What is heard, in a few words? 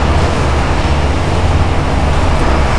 Urban Ambience; de alliance